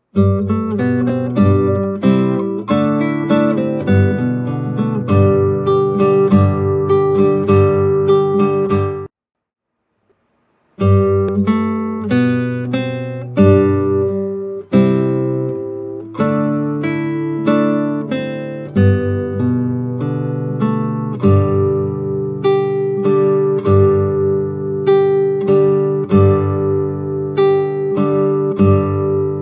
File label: cappricio guitar; Bach; callMeByYourName